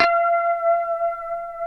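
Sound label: F#5 harmonic, guitar; Spectrasonics; Hans Zimmer Guitars VOL-1; 90's Sample CDs; HYPEMONICS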